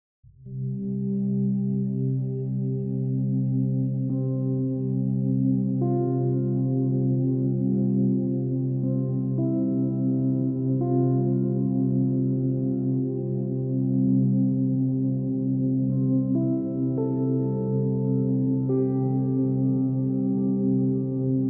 Spa Music Meditation Rain Sounds Relaxing Music

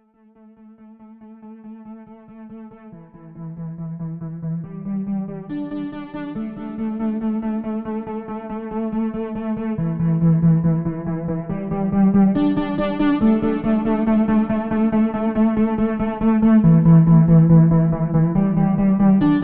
Trance no drum; Fl studio

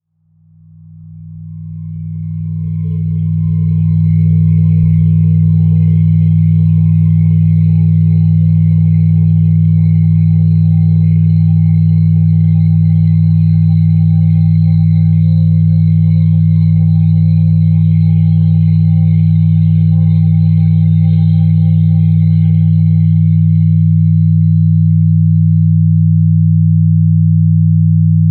Binaural Beats Gamma Brain Waves 60 Hz

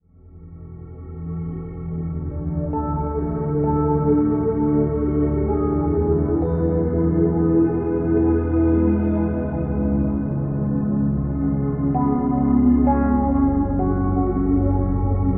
Tibetan Singing Bells Monks, Music for Meditation

